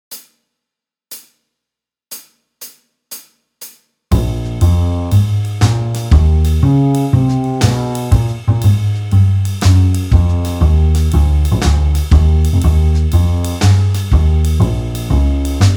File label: piano blues 120; Etude 2 Backing Track